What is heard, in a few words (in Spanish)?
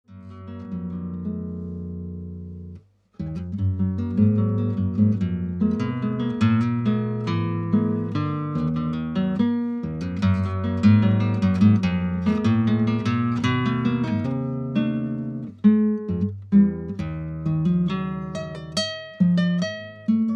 milonga oriental